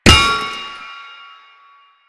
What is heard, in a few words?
Tank Shield Impact; Warframe; Lasrian